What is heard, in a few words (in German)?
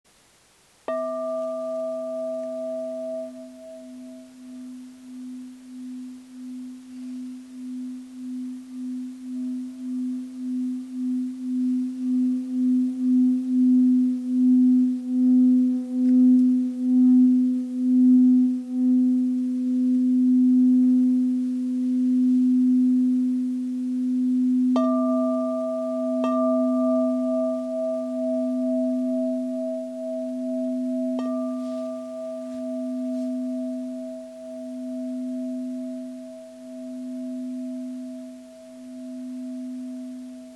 Hörprobe Clara 26cm E